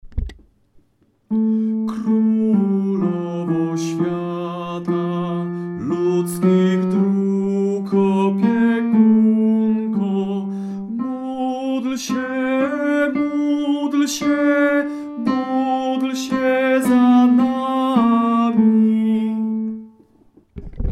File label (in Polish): tenor; Krolowo